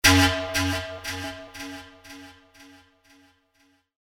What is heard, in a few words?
panflute; crysis warhead